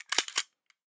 gun quick reload cock; nova

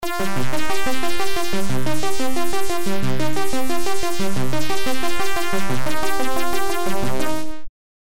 binaural spectrum

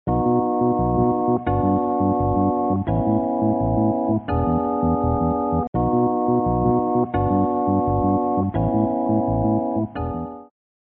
throbbing maelstrom organ

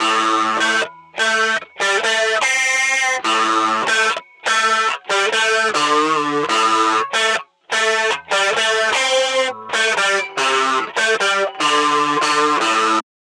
guitar the chorus

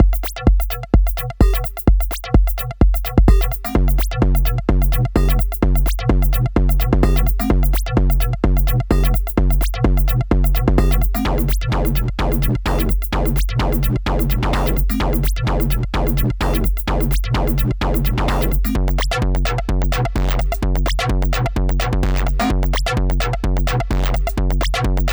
electro house; corrosion

